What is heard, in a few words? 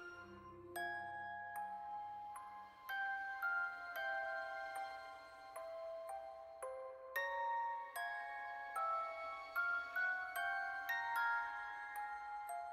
mood loop creepy